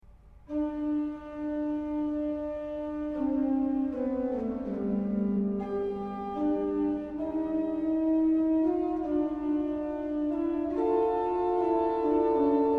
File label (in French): Fantaisie 4, orgues; LCouperin